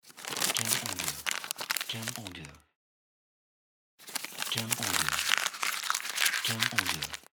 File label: Paper Crumpling